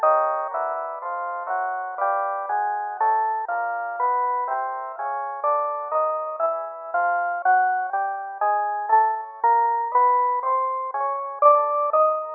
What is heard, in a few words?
piano shepard